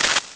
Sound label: extinguish, phos grenade; weapons; Soldier Of Fortune II Double Helix; XBOX